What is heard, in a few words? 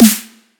nice snare